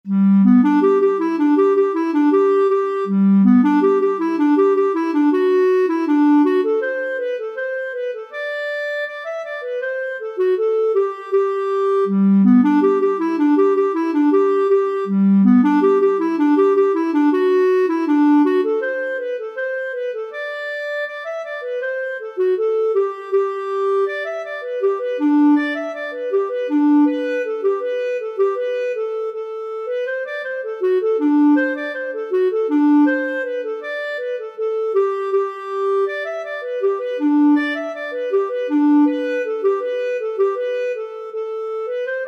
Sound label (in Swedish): Skeppar schottis G dur